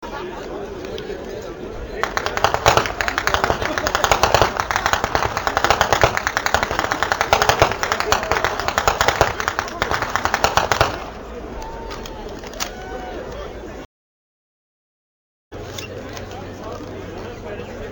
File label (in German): schnalzen; feldkirchen